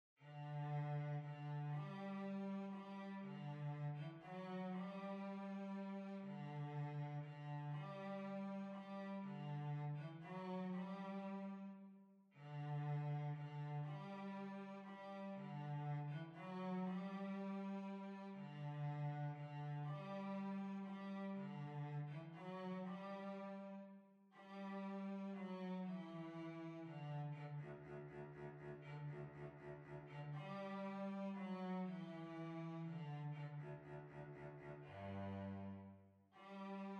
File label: Waltz, Violoncello